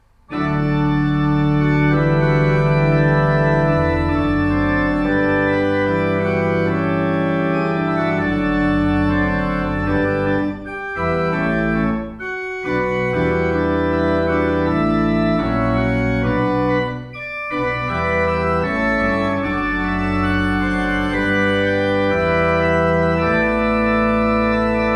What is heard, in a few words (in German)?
Walcker, gebrauchtorgel